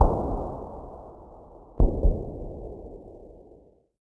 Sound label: cannonade; ambient; World of Tanks Blitz